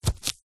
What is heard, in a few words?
sponge brush wet paint single dab on paper; zapsplat